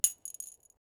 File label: anguish